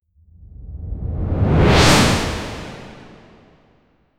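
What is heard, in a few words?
fast whoosh sound futuristic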